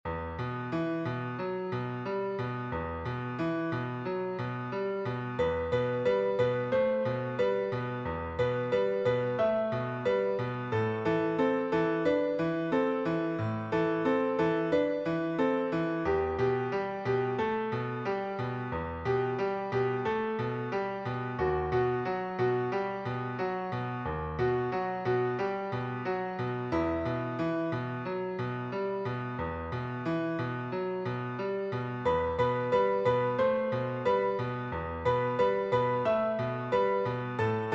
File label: piano